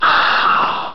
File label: Pain; Archvile